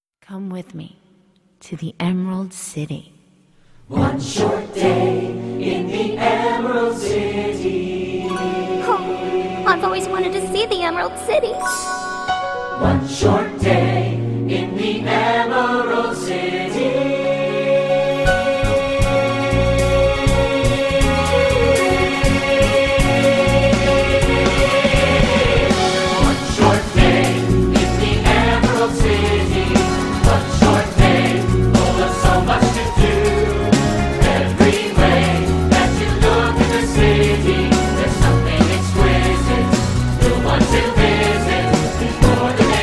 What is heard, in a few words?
VOCAL GUIDE